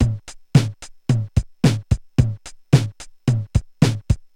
Korg MR-16 (110BPM), beats

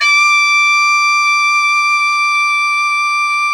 MIZMAR D5

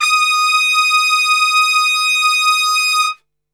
D#4 PIC STR, Brass; Best Service; Brass Super Section

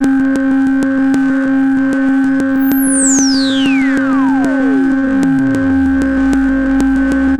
SYNT, 150 BPM; Ueberschall; Drum & Bass Resonance

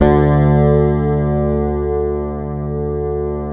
Fingered bass R; Sampleheads; Will Lee Bass Library

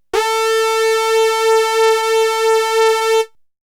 Synth; ZERO G - Datafile DISC 1

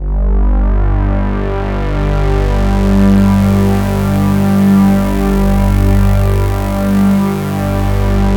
analog swell; Sound Gallery - Charged

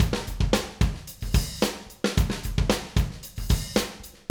Acoustic Live Drum; Platinum Audiolab

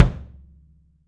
KICK, 3D Percussives, Studio Kit; E-MU Producer Series Vol. 5 – 3-D Audio Collection

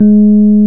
PNO, Rhodes Mk.V; Keyboards of The 60's and 70's

